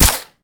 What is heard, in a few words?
Wpn fire